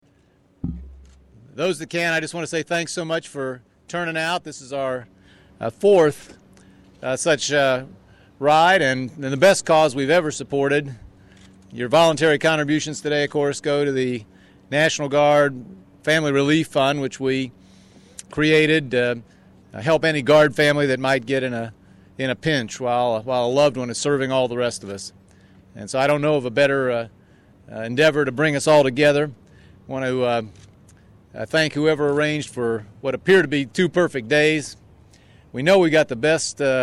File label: motorcycle ride; charity motorcycle ride to benefit the Indiana National Guard Relief Fund; Governor kicks off 2008 charity motorcycle ride; mitch daniels